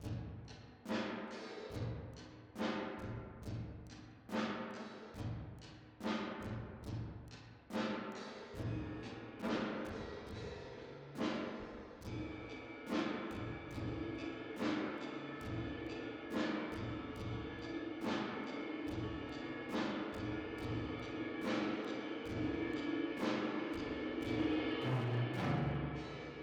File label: Drums Verb